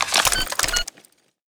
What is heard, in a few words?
c4 disarm start